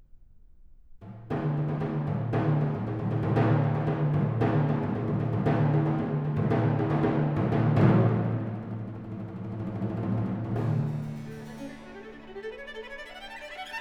Sonata, for trumpet, strings & continuo, Adagio

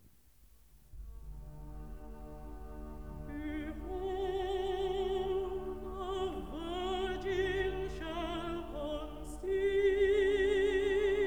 Recit, Contralto; Messiah; Behold, a virgin shall conceive

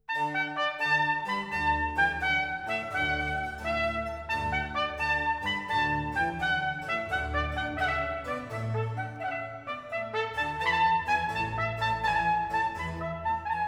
Sinfonia in D Major for Trumpet, Strings and B．C．; Allegro; Il Barcheggio; Baroque duet

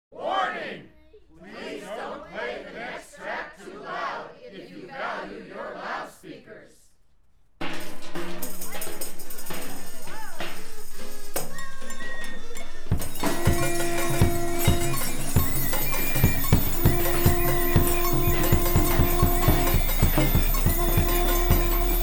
Special Burn-In Noise; Stereophile Test CD, Vol 3

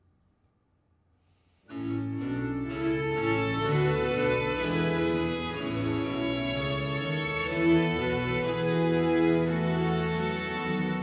Concerto Grosso in B flat major; Corelli

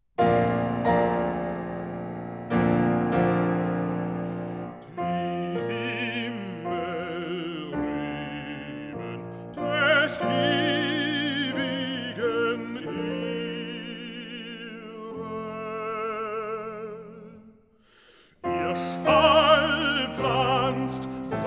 Songs for voice & piano; Die Ehre Gottes aus der Natur; Beethoven; Lieder

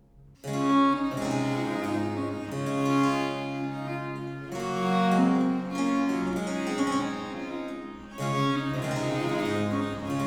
Menuet; German Chamber Music before Bach

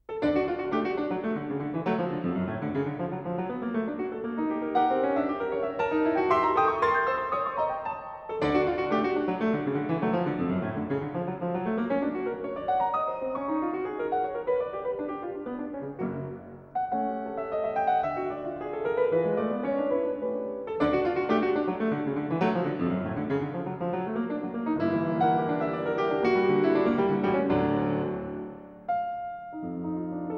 Improvisation for piano in D major, Presto; Improvisation No. 9; Presto possible; Poulenc

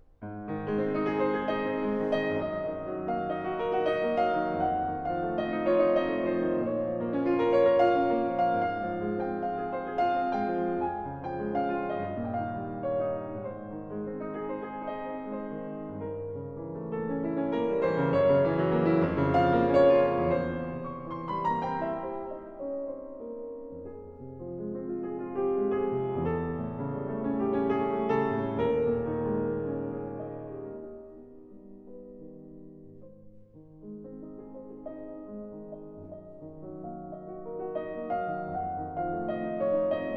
Carnaval for piano; Chopin; Schumann

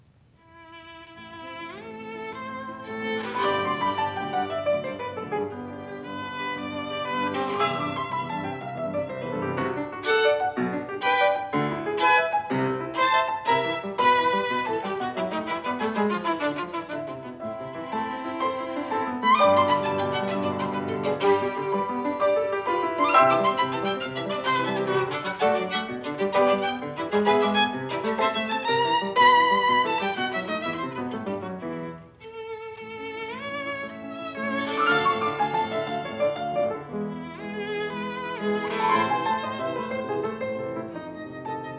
Sonata for Piano and Violin in D major, Variation; Sonata for Piano and Violin No. 1 in D major Op. 12/1; Variation III; Beethoven

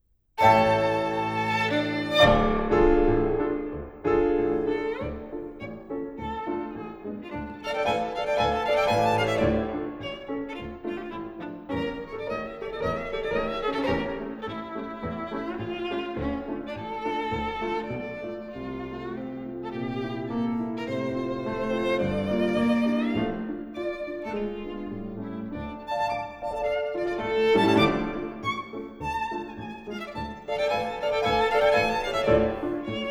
Marche Miniature Viennoise, for violin & piano; The Kreisler Album

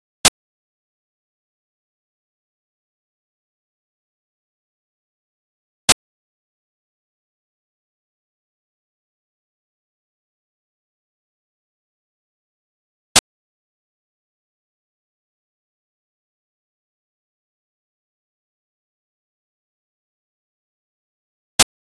Pulsive Signal (40ms) 0db L+r; Denon Audio Technical CD

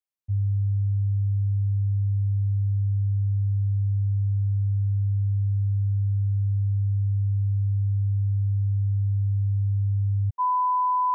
De-emphasis test tones at-20dBFS (L+R); Stereophile Test CD, Vol 2

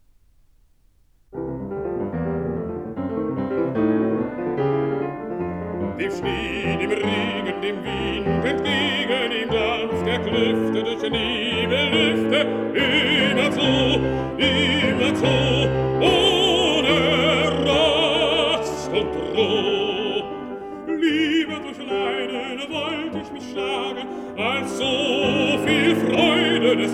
song for voice & piano

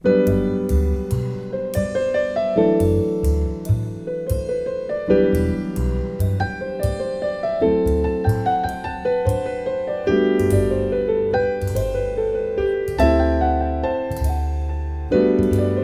Variations, for keyboard

